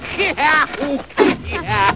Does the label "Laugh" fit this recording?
Yes